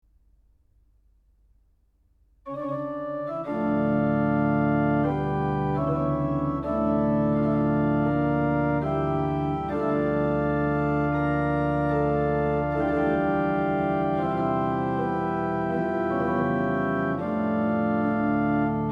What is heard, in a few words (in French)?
Fonds d'orgue; Louis Marchand; Premier Livre d'Orgue